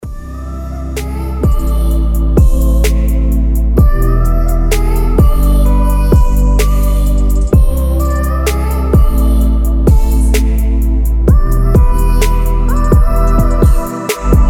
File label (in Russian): rington spokoynyiy budilnik